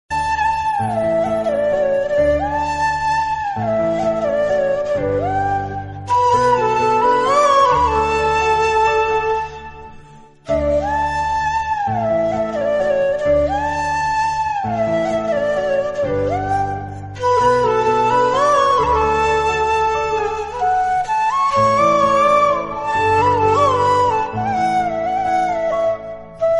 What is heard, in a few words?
old is gold flute ringtones instrumental ringtone hit song status bgm ringtone